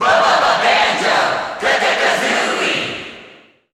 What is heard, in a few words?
Cheer English; Banjo & Kazooie; SSBU